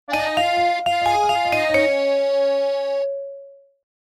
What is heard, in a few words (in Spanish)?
audiologo corto